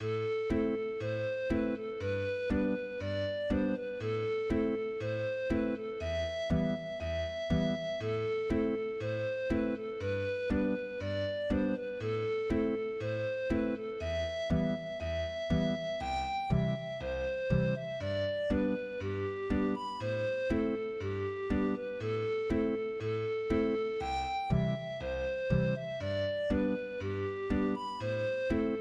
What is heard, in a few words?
Goddesses Am instrumental